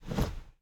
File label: driver foley wheel turn; Blind Drive